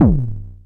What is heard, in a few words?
FX A, ANALOG; Sound & Vision - Gigapack II